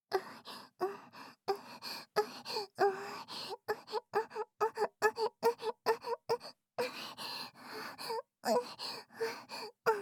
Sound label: Chuanxi mid; 搾精悪魔ちゃんの5日間寸止めゲーム～最後の日に失敗しちゃったら惨めな結末を迎えるよ～